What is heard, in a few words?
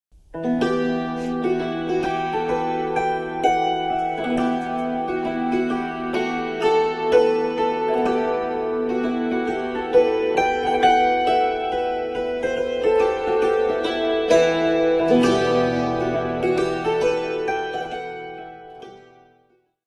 Golden Keyboard, Reel; Father Kelly's Reel; Salmon Tails